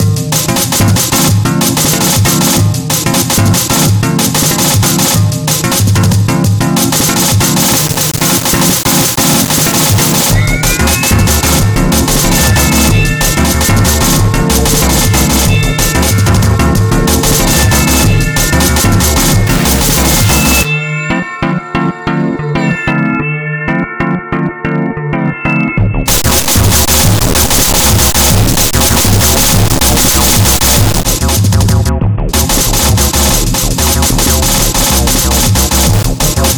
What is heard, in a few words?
breakbeat remix